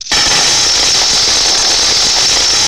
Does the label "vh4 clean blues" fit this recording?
No